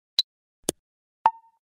klik oneplus

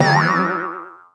ulti hit

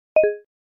video stop

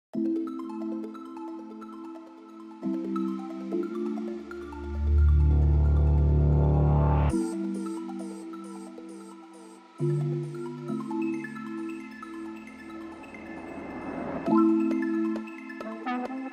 chill trap

